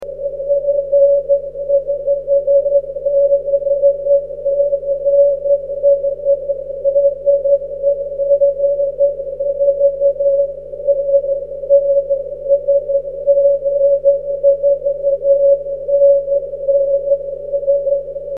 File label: cw